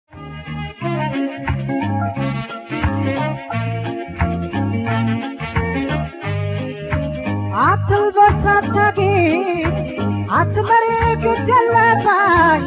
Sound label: tango